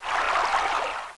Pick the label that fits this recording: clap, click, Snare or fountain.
fountain